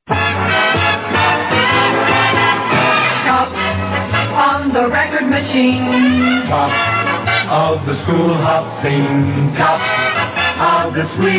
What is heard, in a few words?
jingle